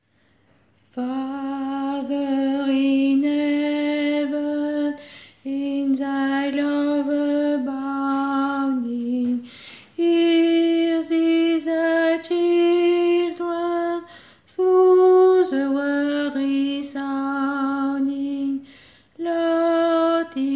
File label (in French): alto voix